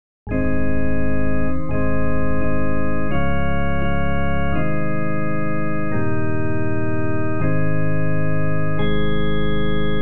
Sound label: instrumental hymns; tis finished